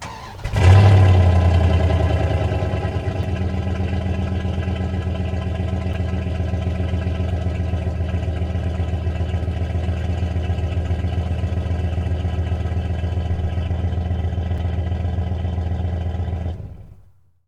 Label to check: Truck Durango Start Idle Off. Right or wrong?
Right